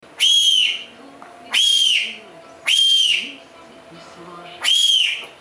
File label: Bobcat